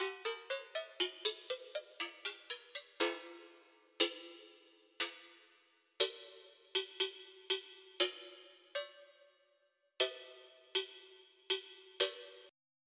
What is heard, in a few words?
Mambo Bell